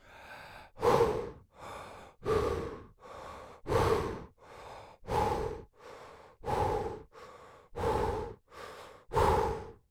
Human Male Heavy Breathing

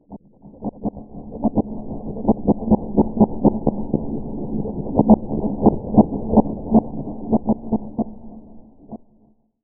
Transition Reverse Warble Reverse; Production Element

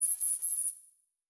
Digital; Multimedia Internet CD-Rom Flash

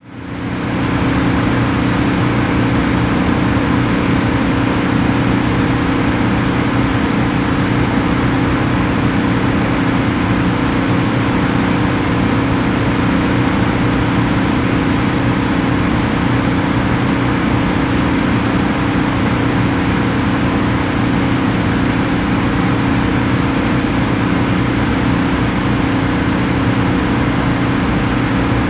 Industry Machine Generator Machine Running

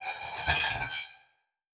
Transition Feedback Electronic; Adobe; Production Element

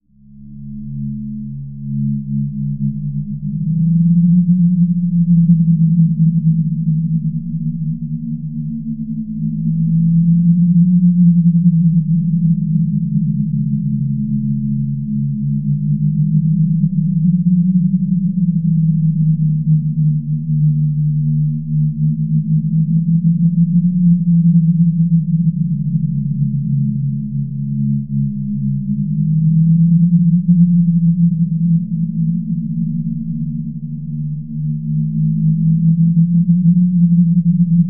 Drone Bed Spooky Sine; Production Element Imaging; AdobeCC

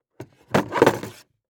Industry Tool Box Remove Hammer

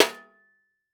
Impact VCR Hit By Medium Metal Pipe; Impacts

